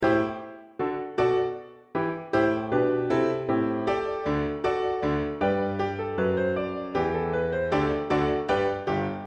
Piano

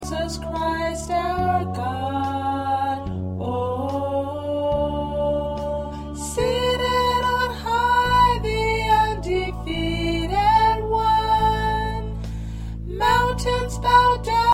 Soprano, choir parts

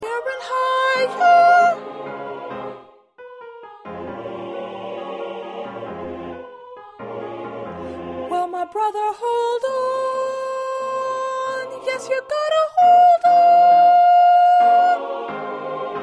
Small Group Soprano

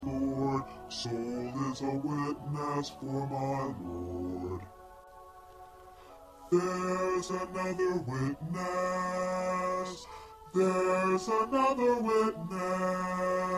P Witness 2nd Bass

